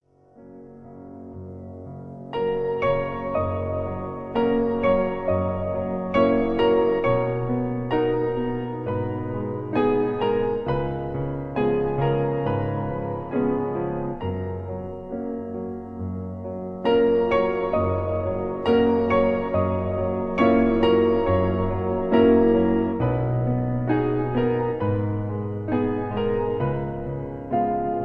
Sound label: Piano